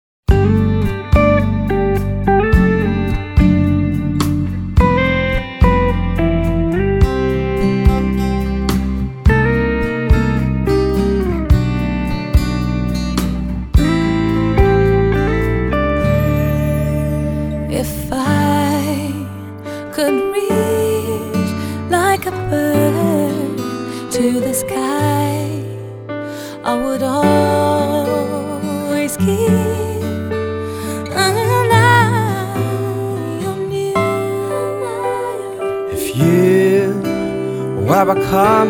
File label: swing low